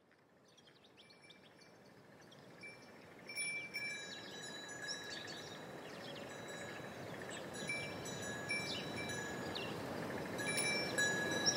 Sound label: Borgo Filaga - Campanacci; Radio Papesse; 5-Scape